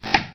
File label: slide